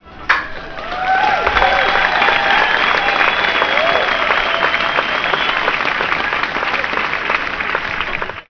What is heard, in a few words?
hit crowd cheer